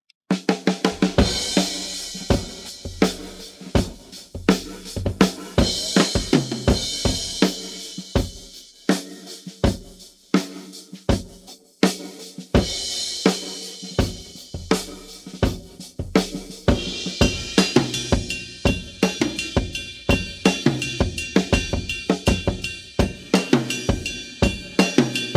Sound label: drums pattern